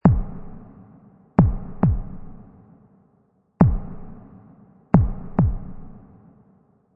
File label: Horr Bass Dr Heart Bt Bm, Bass drum loop at 135 bpm.; Dark Bass Drum Loop 135 bpm; Drum Loops (Royalty Free Music Loops:Music Production Loops